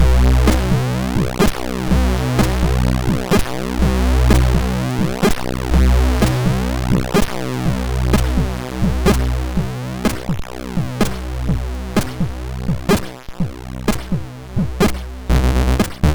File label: Aztec quick step, sid, MOS6581R2